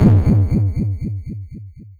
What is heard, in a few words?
IED Drum; Cruelty Squad